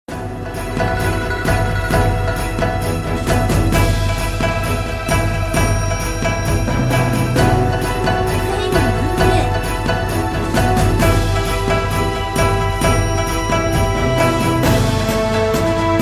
武斗场

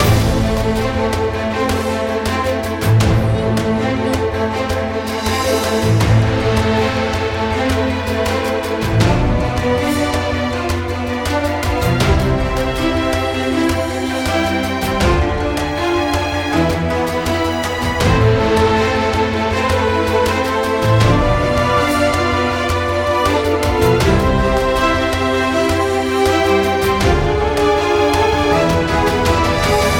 过关斩将音乐 Expedition BG

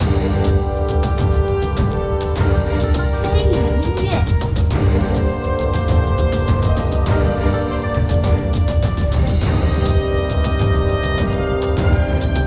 城池争夺

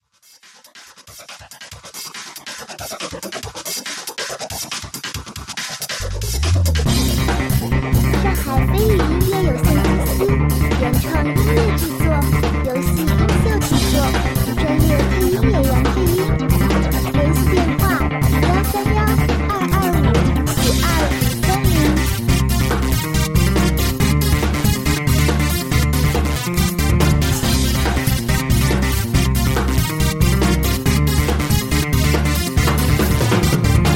电子激烈紧张赛道战斗

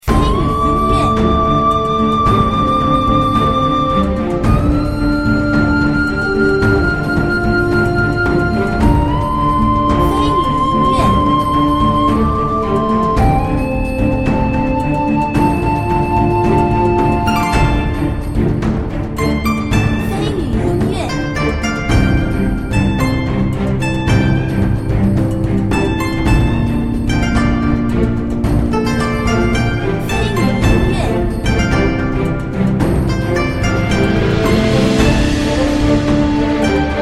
空灵-大气-恢宏

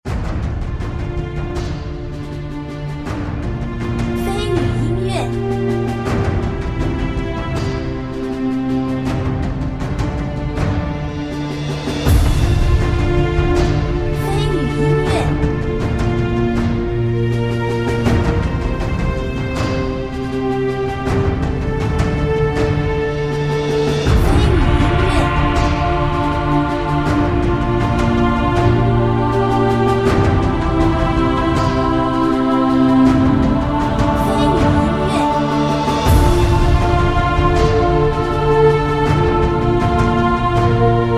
boss 恢宏 大气

